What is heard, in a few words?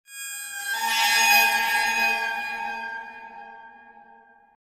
scary violin